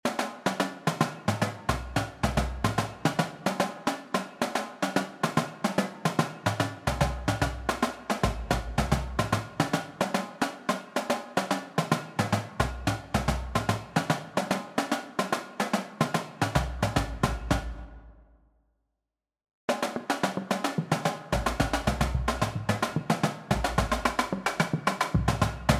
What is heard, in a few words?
Horizon Double Beat, Drumline; Drumline Writing Demo